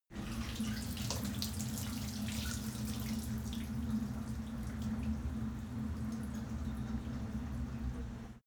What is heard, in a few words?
cleaning machine dripping water inside machine